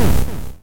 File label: enemy shoot; Metal Bot